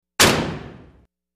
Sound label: Industrial Oven Door; appliances